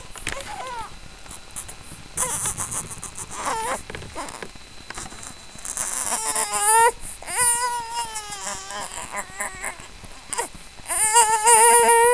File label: crying